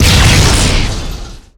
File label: Explo Grenade Energy; Destruction